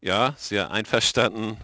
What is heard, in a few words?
laugh; The Phonetics of Laughter